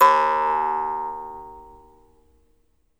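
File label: Jaw Harps, Pitched Percussion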